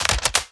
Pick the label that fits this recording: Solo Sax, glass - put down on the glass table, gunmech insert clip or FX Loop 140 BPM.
gunmech insert clip